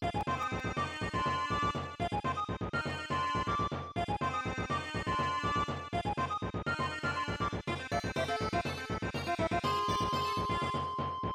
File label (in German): herbst; harvest moon